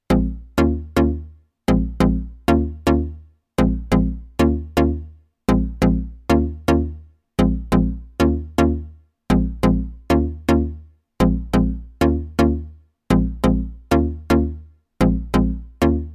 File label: Dub